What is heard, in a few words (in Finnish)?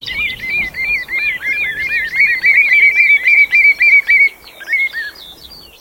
punajalkaviklo; Kosteikot